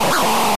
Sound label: dragon